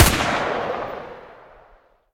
fal fire, guns

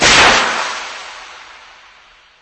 AT4 fire, Guns

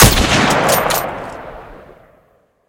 l96 fire, Guns

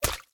water, Guns hit

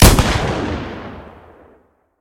python fire, Guns

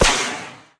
flaregun fire; Guns

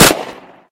mp7 fire; Guns

